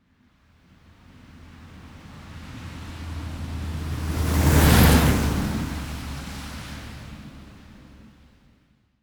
slush asphalt medium speed puddles, Cars In Motion - Wet Asphalt; stereo; Soundholder